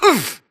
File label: grunt hits; Titanfall 2